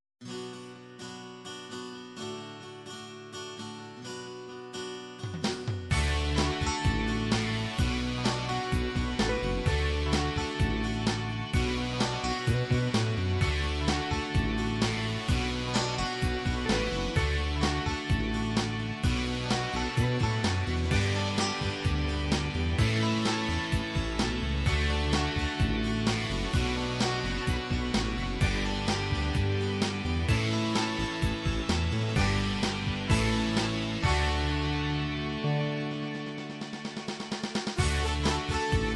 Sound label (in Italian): italian il suono delle campane, midi